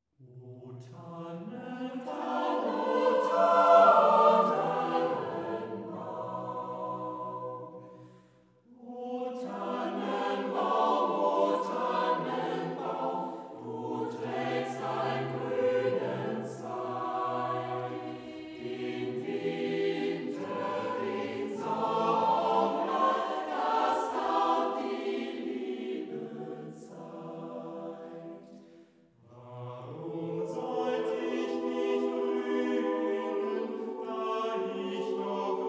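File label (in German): Choir, O Tannenbaum du trägst ein grünen Zweig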